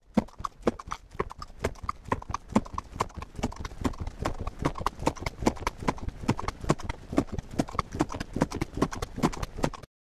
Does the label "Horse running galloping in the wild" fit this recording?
Yes